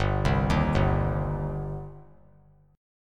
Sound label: G Suspended 4th Sharp 5th G, down; Gsus4#5 arpeggiated; JGuitar